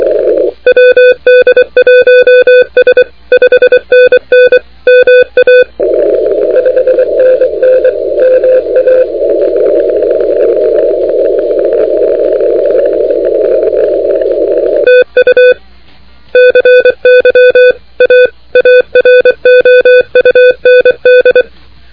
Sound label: CW 28MHz; ARRL10M